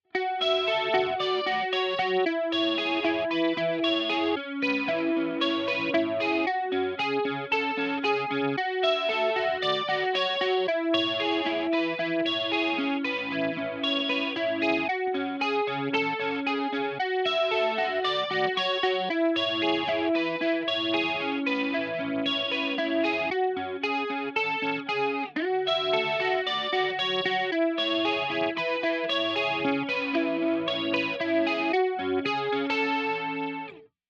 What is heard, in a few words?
chorus On